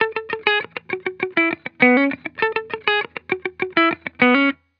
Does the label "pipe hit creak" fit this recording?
No